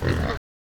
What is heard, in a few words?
Wild boar Neg